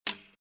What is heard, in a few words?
Typewriter single click